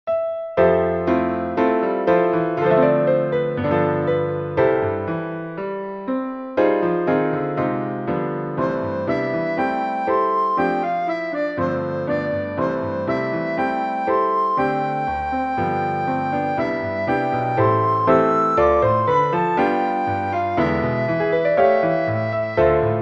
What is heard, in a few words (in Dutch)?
duet sopraan; Flierefluiter 6